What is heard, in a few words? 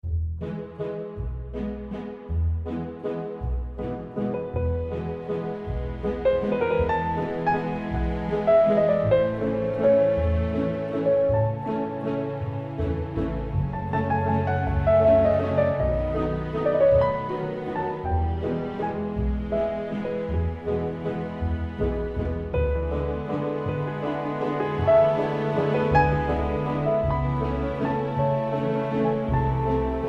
moonlight waltz